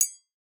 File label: Metal Glass; Sample Growing Stream 002; Ear Candy